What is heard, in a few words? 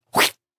cut heart